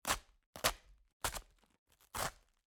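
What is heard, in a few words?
sound of chopping, cutting flesh meat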